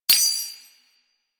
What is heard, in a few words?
Step Spur, Foley, Footsteps; Enemies; Warframe; Duviri; Dax